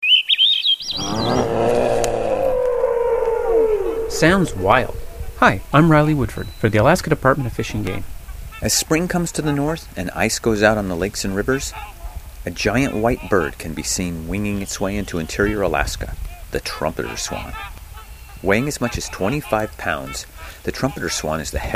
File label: trumpeter swans; As spring comes to the north and the ice goes out on lakes and rivers, a giant white bird can be see